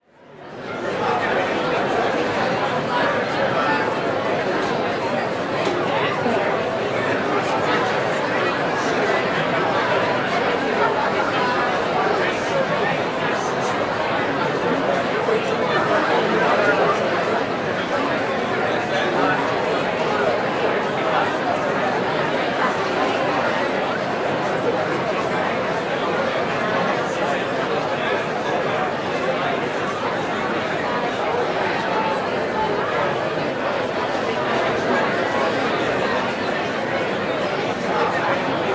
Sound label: Airport Busy Lounge Boarding Area, Walla; Sound Ideas; Walla Series